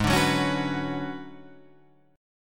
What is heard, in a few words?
B Minor 6th Add 9th G sharp